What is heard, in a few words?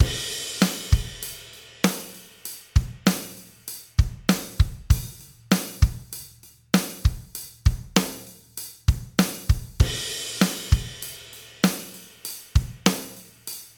Trashed Drums Unprocessed; Saturator X